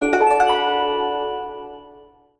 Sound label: Player In Jingle